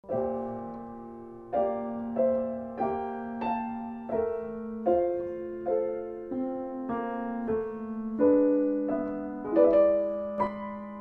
una corda pedal causing wrong notes; Bach Sarabande

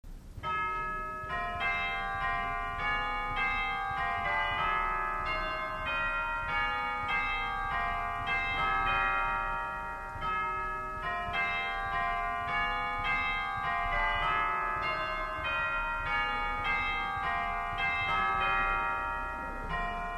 Organ Great Chimes

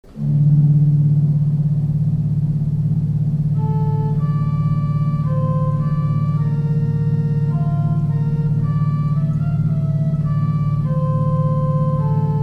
Organ Great Gemshorn 16

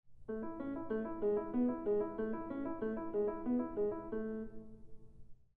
alberti bass; Haydn

